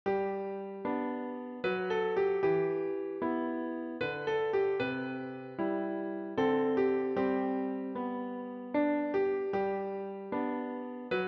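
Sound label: piano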